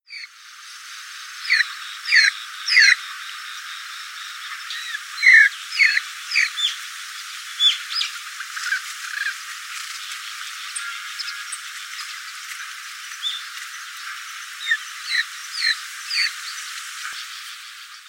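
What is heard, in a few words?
Green Pheasant, juvenile; キジ; 幼鳥; 愛知県豊田; 渥美美保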